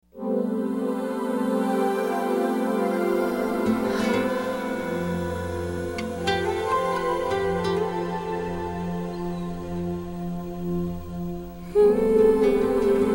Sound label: Haunting Beauty